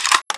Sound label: mg36 mag out; weapons